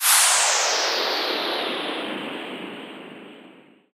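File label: free spins splash wheel slowdown; wild worlds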